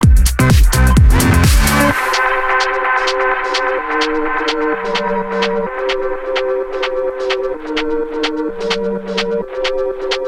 Electro Movement Mix

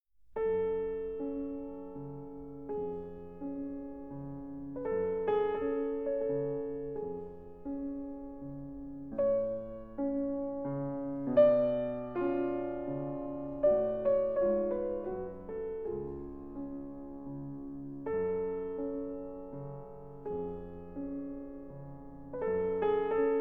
Piano Sonata in A Major Andantino

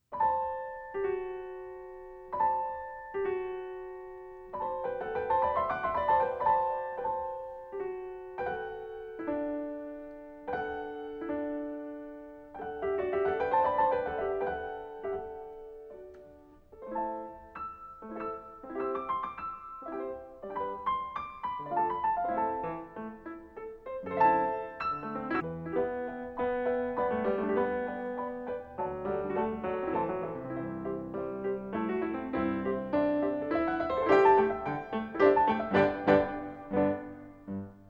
Menuet Version for Piano 4 Hands

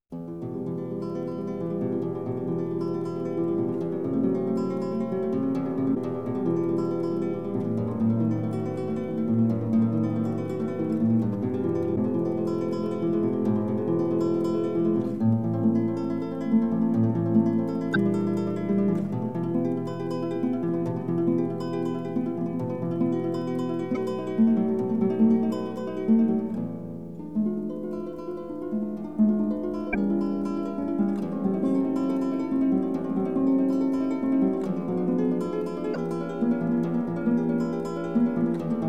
Estudos in E Minor Allegro non tropo arpejos

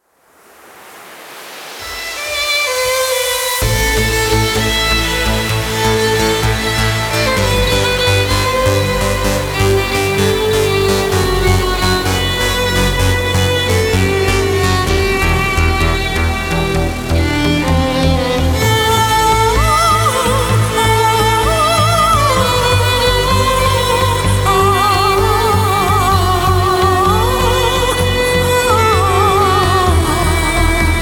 Tango

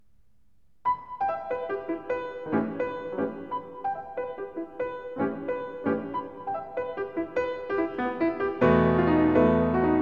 Three Quarter Blues, piano

